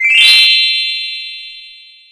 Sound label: Level Up